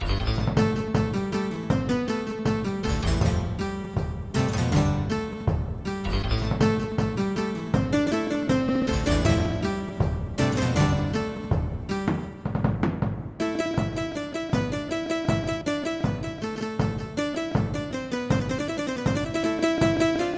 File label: greek instruments